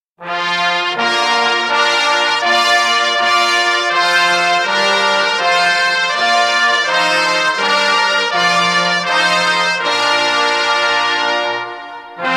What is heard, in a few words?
brass